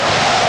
Winter games

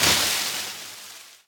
Tree Leaves Hit

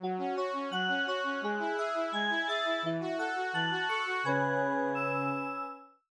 Station Jingles; Void

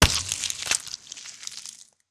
Sponge Hit; Legend of Keepers

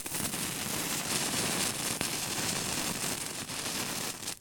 Fire,Fuse,Burning Wic; Monster Jam Steel Titans

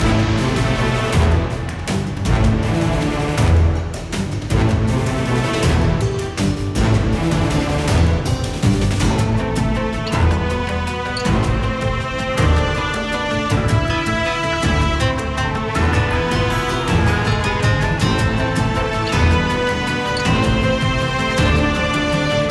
Battle High; WaxArches

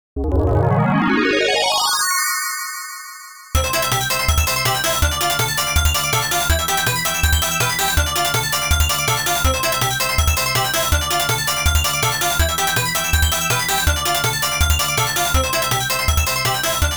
Bonus Game

